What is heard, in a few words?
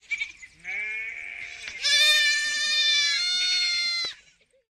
Boo; Farmer; Pitch Black Audio Pong